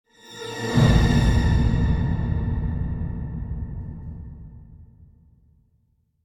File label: Symphonic Hit Deep And Dramatic Final Chord; Hollywood Edge; sound designer's toolkit v2